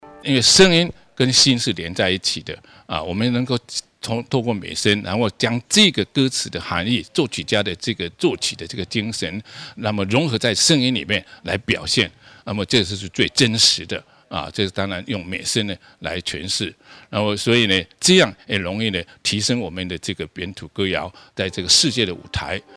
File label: Vocal Music